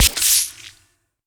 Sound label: Splash